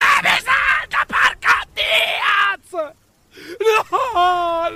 crying

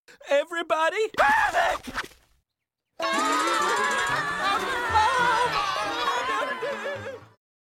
everybody panic with screaming

